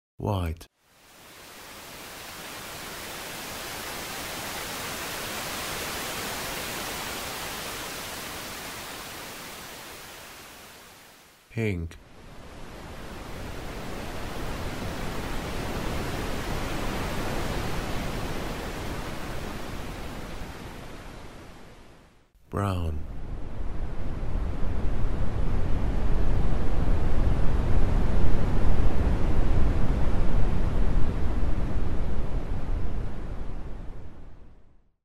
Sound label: White Noise Noise; Graf Lenz